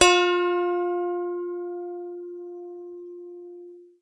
lap harp pick F4; Ethnic

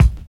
KICK 2; East West - Phat & Phunky

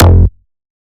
Bass F, Bass Synth

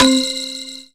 Ethnic Synths, Dance Synths; Best Service ProSamples vol.37

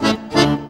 ACCORD HITS; Best Service; World Colours

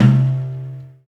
PRC DAIKO, Misc Drums, World Dance; Spectrasonics; Supreme Beats

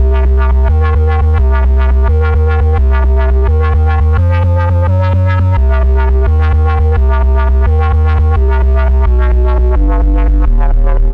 DC LFO Bass 172, breakcore

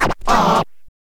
scratch kit, vinyl

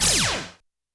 SFX Gun, YAMAHA RM1X

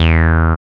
BASS, Tekno Industrial; East West

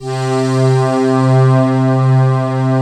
FINAL PAD; ENDINGPAD

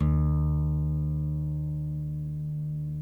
NYLON NO VIB E 2A, Guitars; Spectrasonics; Hans Zimmer Guitars VOL-1

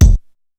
KICK, Big Beat; Big Beat Samples & More; AKAI

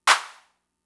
Clap, Fricke MFB-522, Drum Machines

